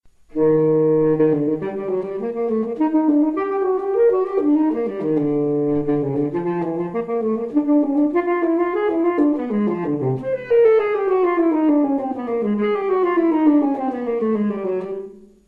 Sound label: tenor